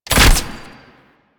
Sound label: Bow Shot